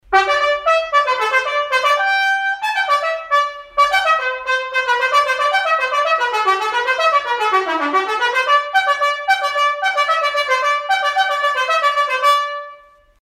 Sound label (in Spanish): trompeta